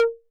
Conga Hi 808 Saturated B

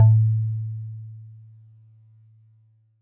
Warble Celeste DX100 A-1

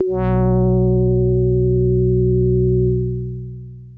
Synth, Dragon castle FM

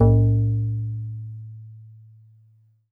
Steel Drums DX100 A1

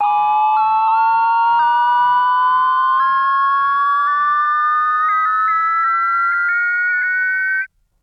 Juno106 Pitch Riser Vinyl Synths C3; vinyl fx

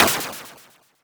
Noise

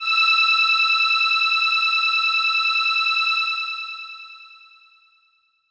Voices Kawaii E5

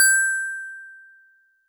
Metal Tube DX100 G7

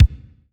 BD Verb

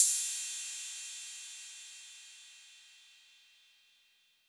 Cymbal 808 Decay, Clean Digital

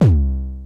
Sub37 Drop Kick Vinyl Synths C3; vinyl perc

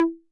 Conga Mid 808 Saturated B

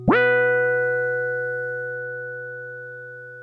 SYNTH High; 90s

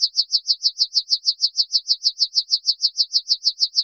Chirp FX DX100 Fs4; birds

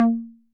Conga Low 808 Sat; Saturated

